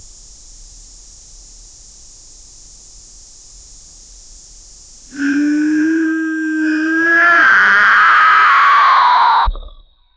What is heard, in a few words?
the angels cry, angel's cry